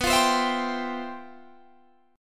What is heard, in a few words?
G 13th B, chord